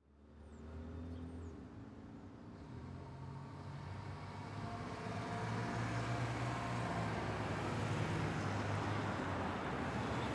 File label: Ambience Traffic Curve; AdobeCC